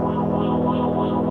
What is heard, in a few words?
spiral circle; tuxpaint; magic